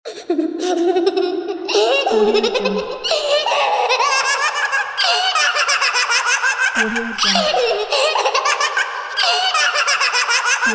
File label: kid evil laugh